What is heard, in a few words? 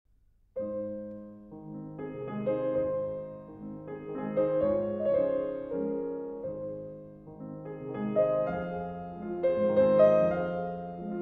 brahms lullaby waltz in a flat major